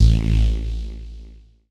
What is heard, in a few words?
KEY SHOT TEKNO 140 A; Techno2; Singleshots